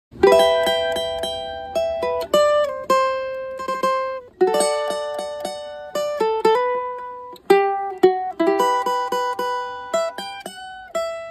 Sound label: Cavaquinho; کاواکینیو